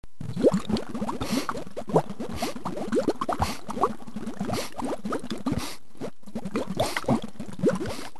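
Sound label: bubble, didgeridoo; Circular Breathing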